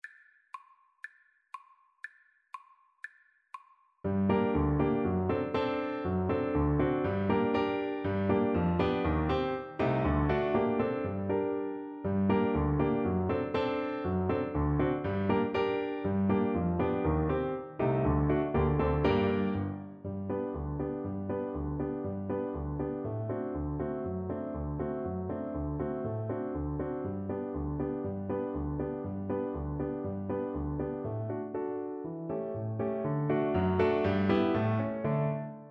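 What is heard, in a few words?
viola karaoke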